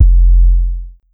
Kick Sub

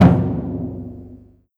Tom Hit Low

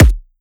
Kick

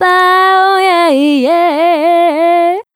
Vocal "Bow Yeah Yeah"

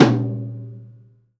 Tom Low Death Metal

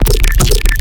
Space

